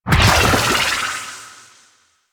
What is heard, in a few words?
Aqua Board Speed Boost; Warframe; Yareli